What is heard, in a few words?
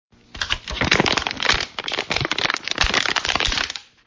crinkle